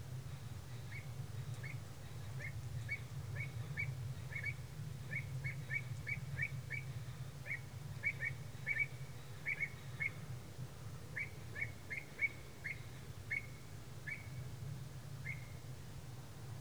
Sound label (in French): Avocette elegante Recurvirostra avosetta; carnet nature ete